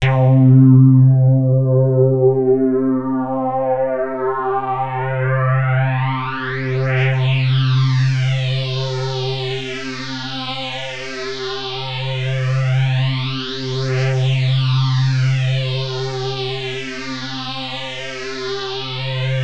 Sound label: Access Virus B; Synthworx